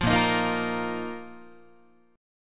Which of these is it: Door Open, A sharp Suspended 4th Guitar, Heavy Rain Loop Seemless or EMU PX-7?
A sharp Suspended 4th Guitar